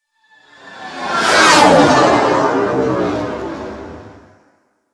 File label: Ship Close Fly By, Engines; Warframe; Vehicles; RailJack; RailJack Enemy Ships; Grineer; Engines Generic